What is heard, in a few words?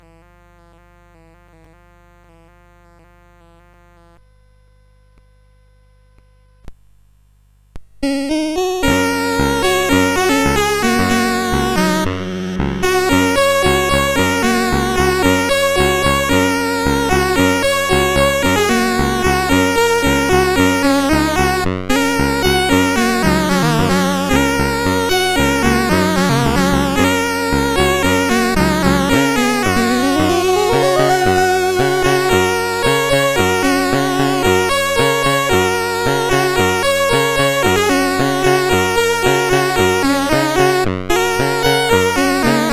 CSG 8580